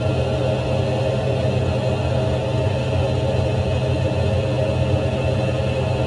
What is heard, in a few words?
docking bay; ships rooms; CosmicRage; CosmicRageSounds